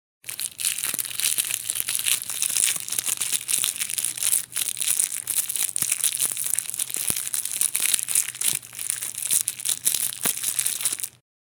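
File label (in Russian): звук шелеста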